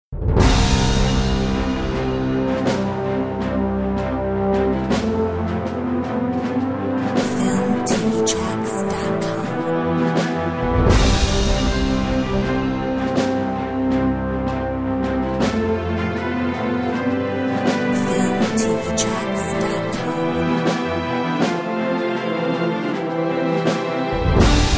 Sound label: Orchestral overture